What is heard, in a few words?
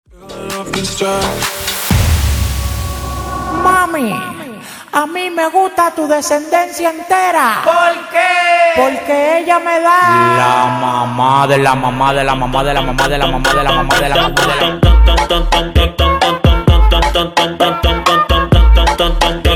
Intro Mashup Bass house